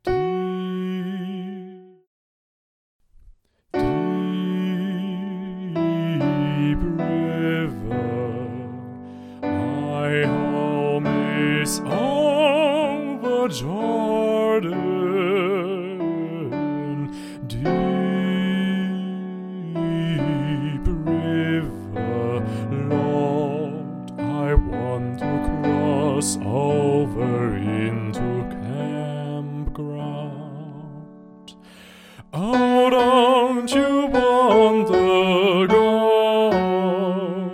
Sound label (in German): Sopran mit Klavier Tutti; Deep River